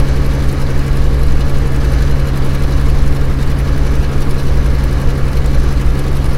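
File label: griffon cockpit right, engines; War Thunder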